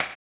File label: HAMBONE; Blues & Soul